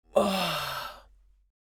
Old lady pleasure moan

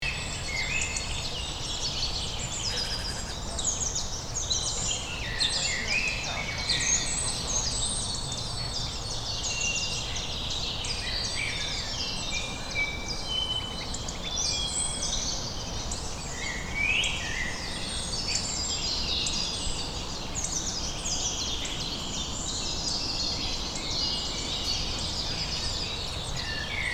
Park playground side street birds chirping loop; gfxsounds

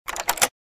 Keys put in lock and opening

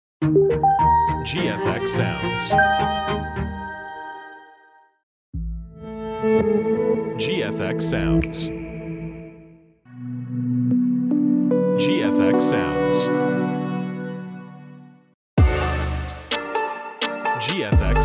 logo intros sfx

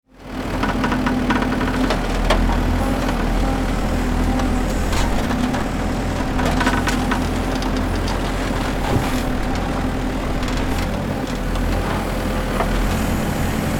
Excavator construction vehicle digging

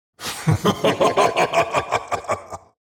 Demon king lord maniacal laugh

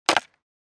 Skateboard stunt ollie designed

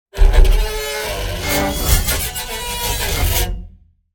Metal wires dissonant phrase

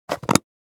Plastic container close; gfxsounds

